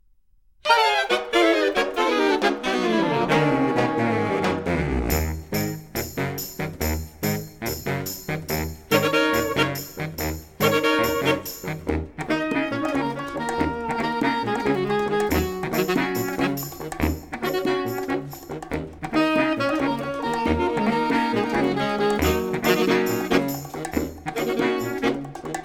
Saxophone Orchestra

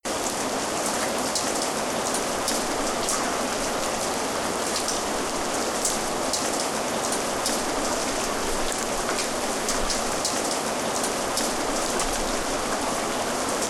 Rain Sound Sounds of Nature; Mother Nature Sound FX

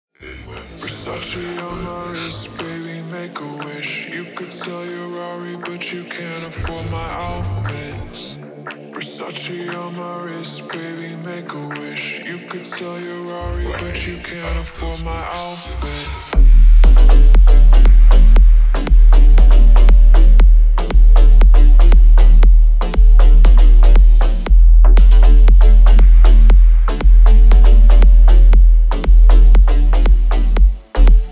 37-39-41Hz Low Bass

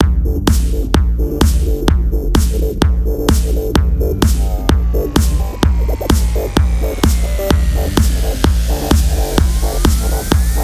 Dance Music Treadmill

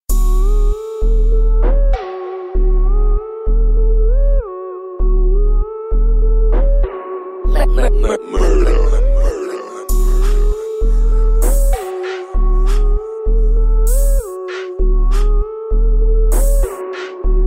37hz-39hz low bass

